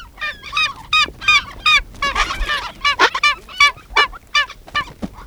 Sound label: skua